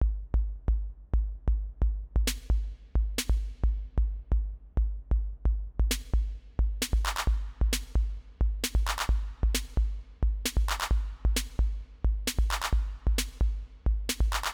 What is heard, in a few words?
808 test